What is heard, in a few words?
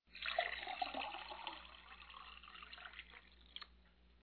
Music, Pub